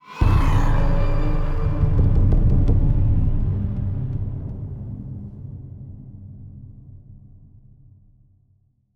Stinger Death; eden rising

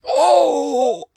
Grave Zombie death

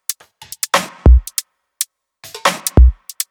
Snare

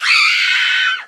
Scream Female

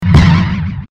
volcano shot; Blackeye

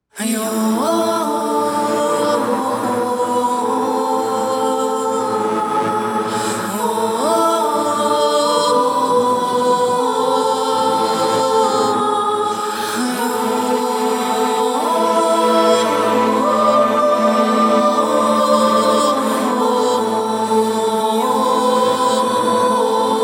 Boss Fight

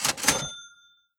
purchase item

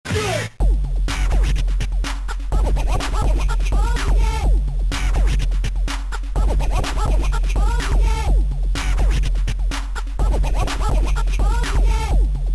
Boombox; VeggieTales LarryBoy and the Bad Apple; GBA

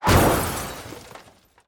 Crash

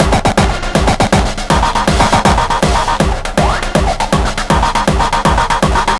Beats Loop; Anger Foot Demo; Angerfoot Beats 12

